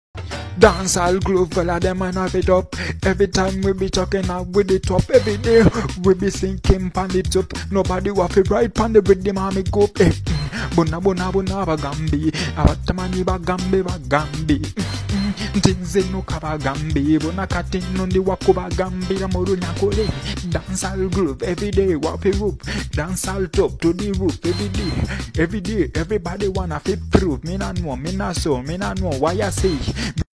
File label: Dancehall groove